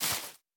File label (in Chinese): 海绵 踩踏声